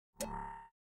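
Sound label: operating system Mac OS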